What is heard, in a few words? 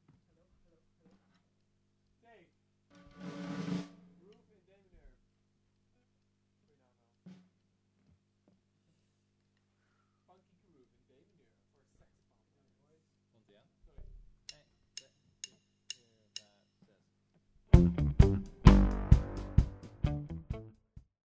Groove 130BPM Dm; Mfly Music